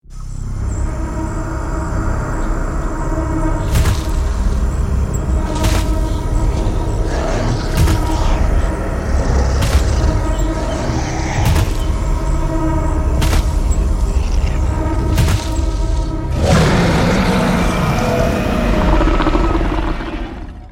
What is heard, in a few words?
Roar